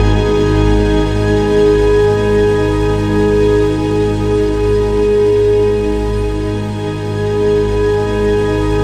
WAVR STRNGS

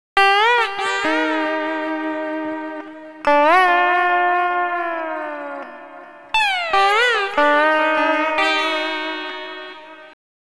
Dark Para Gtr-D#, lounge, 146; Zero-G Kocktail Kollection; Construction Kitz

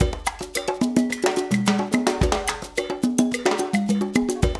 loop, Percussion Slam; InVision Interactive

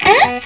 SOLO E, Vintage Blues Guitar

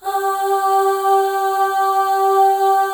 AH FLAIR, FEM; Spectrasonics; FEM.2 AHS

